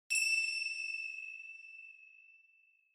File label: Finger Cymbals (Reverb-Short)